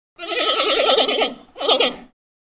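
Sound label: Panda Bleats Multiple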